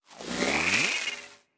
Bolt Charge

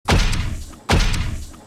Walk

